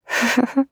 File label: laugh, JPN; Voice lIne; Eternal Return; Katja